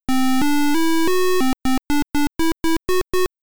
Music Test; Star Wars (Arcade Version); Music Test 1

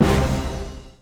Combo Losing Hit; Duel

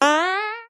Jump

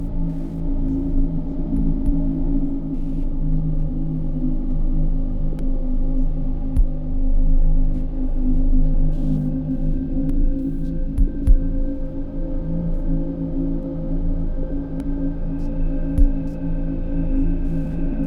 Drone 01 (Looped); HellSign

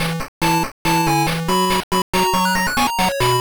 victory jingle; Shinseiki Ultraman Densetsu Pico gamerip 2002

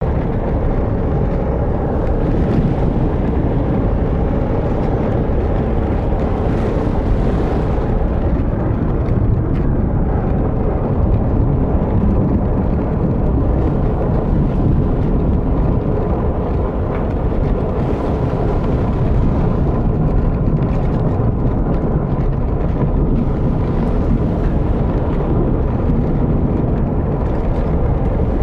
ambient volcanic smoke

